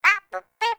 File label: Flying Robot Voice, Ambience; Warframe; Veilbreaker; Kahl Quest